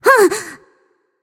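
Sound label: nonverbal surprised; smite; voicepack; izanami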